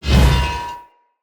Whoosh, Swords; Sub Boss; Weapons; Enemy; Mockery Swords; Stultion

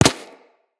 Laser Impact Generic

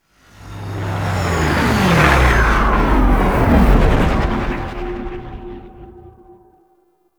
Motor Racing Fly In; Warframe; Motorcycle Racing

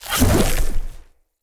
Claw Launch; Enemy; Corrupted Sproutfolk; Combat

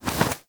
Bird Of Prey Wing Flap Up; Warframe

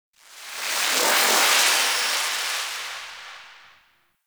Power Rift Buff; Gameplay; Warframe; Zariman

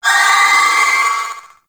Attack Long, Vocals; Warframe; Sentients; Sentient Trooper

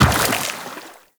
Run Water, Footsteps; Warframe; Tenno

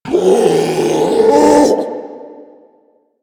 Infested Ancient Strike Scream; Enemies; Warframe

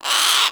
Sea Bird Tranq Dart Grunt; Characters; Warframe; Ostron; Hunting Critters

